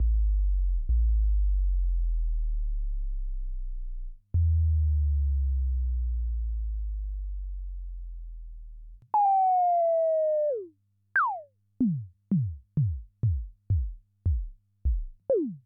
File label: MC202 Sub & Res